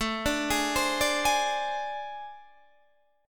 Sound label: A Minor Major 7th Double Flat 5th A, Guitar, DADGAD